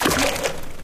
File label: paint blob splat